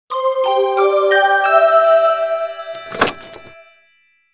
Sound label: Mystery Vault Alarm